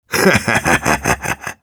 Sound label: Engineer laugh evil, ru